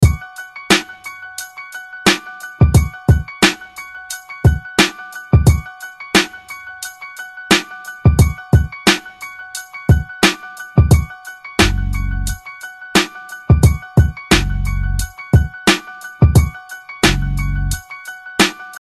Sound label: Break